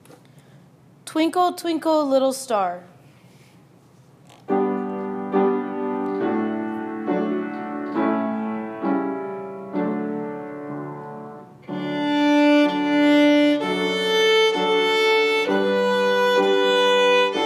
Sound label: Twinkle Twinkle Little Star with Piano